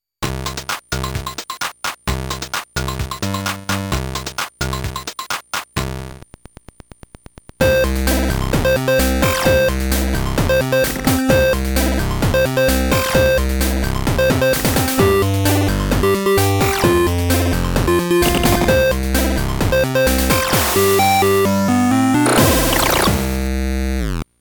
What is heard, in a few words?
pocket pro sound